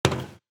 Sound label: Footstep Metal; Footsteps